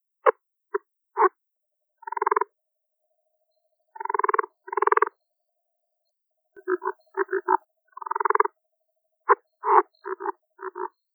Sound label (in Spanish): Rana leopardo